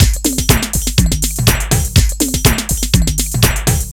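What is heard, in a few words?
drum loops, right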